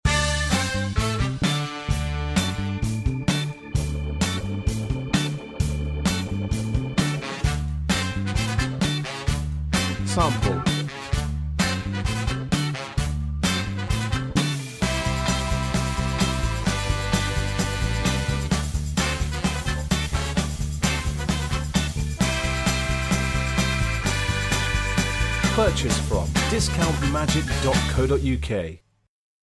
beat

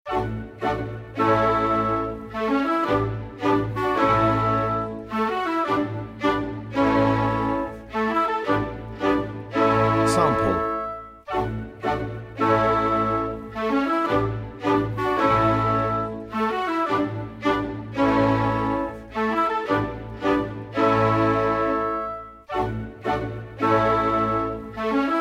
classical

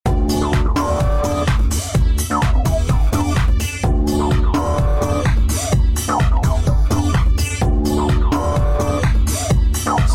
electro, backing

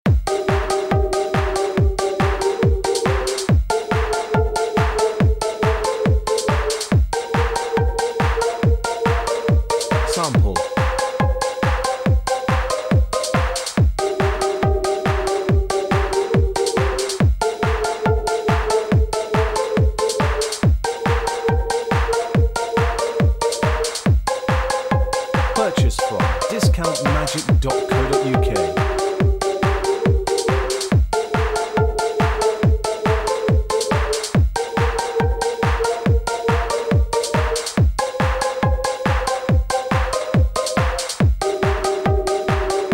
techno, backing

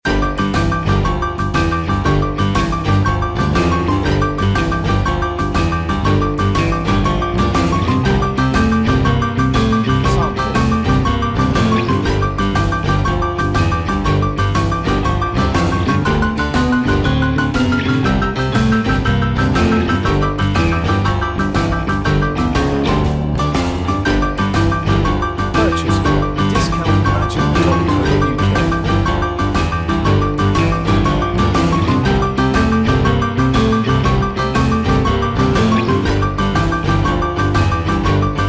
blues piano, backing

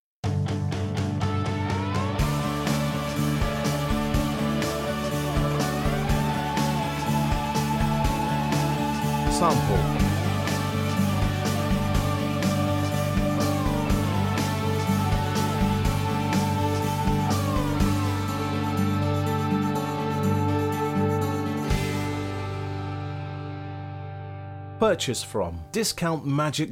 pop

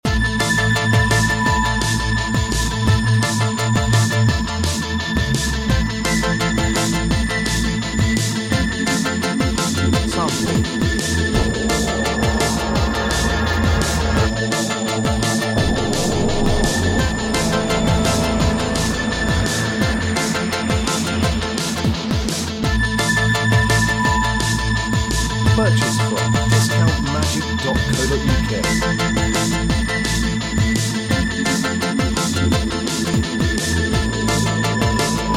space techno

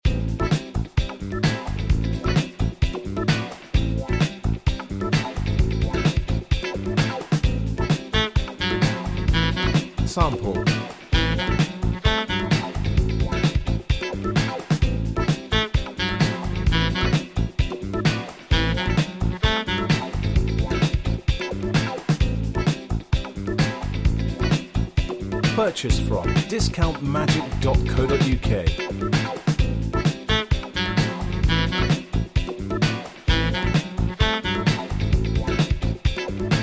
disco

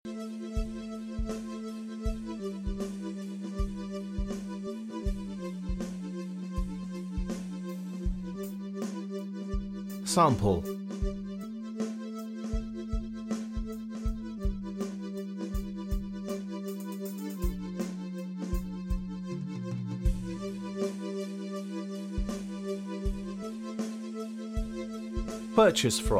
Dreamy Groove

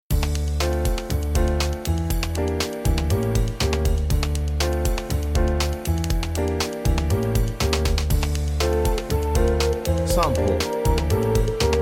piano percussion relaxed

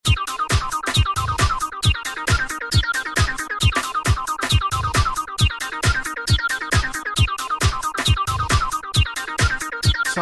beat

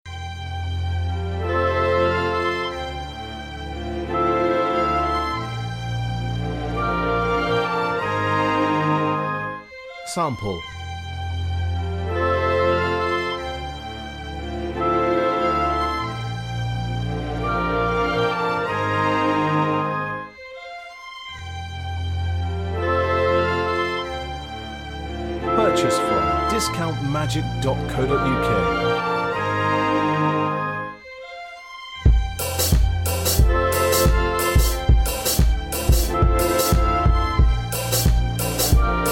classical

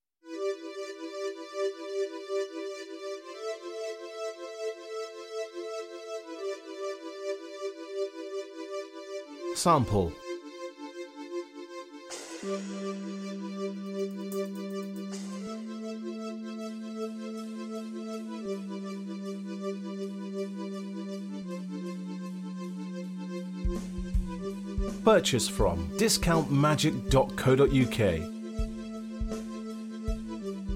Dreamy Groove

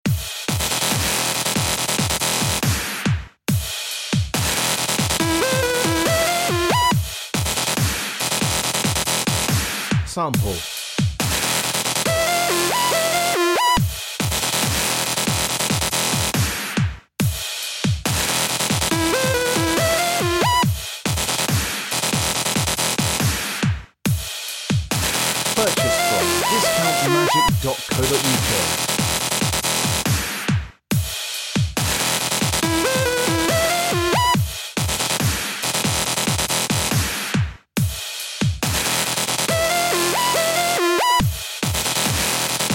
dubstep, backing

